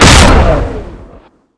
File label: lightning